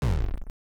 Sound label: Cancel